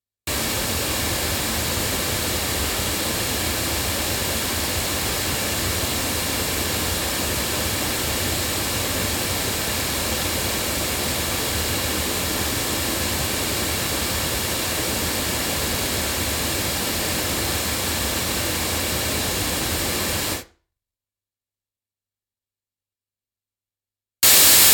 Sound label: ND6000 loudspeakers; JJLabs